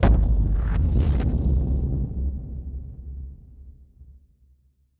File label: Hit Splosion; musicradar; bomb factory